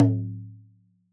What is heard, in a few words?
Bodhran, World Percussion; Cymatics; Emperor World Percussion